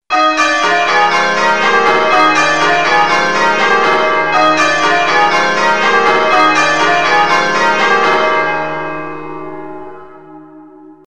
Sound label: Whittingtons, changeringing